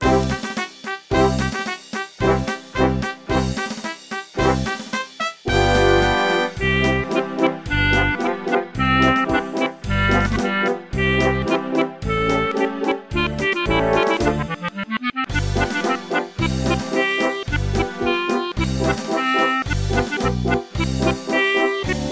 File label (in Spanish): Marcha militar